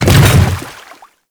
Hammer Boss Step Water, footsteps; Warframe; Grineer Hammer Boss

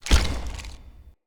Foley Land; Enemy; Characters; Corrupted Sproutfolk

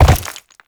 land rock; Movement; Warframe; Uriel

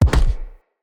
Bow Shot Charged Punch; Weapons; Player; Josalfar Bow

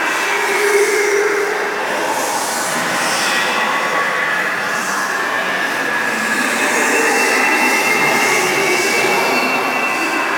Rift Loop 3D; Warframe; Magician

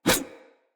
Large Dagger Whoosh Heavy; Snc Large Dagger

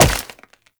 Wall Jump Ice; Footsteps; WallRun; Warframe; Characters; Tenno

